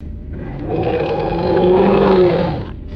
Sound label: extended resonant creature bellow